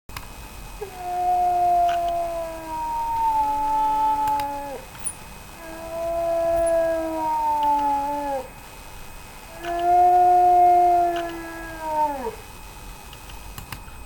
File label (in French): loup